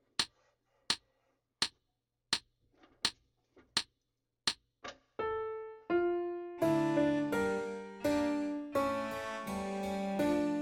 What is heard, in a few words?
Andante Full Tempo with Solo, Alto Sax; Handel-Andante and Bourree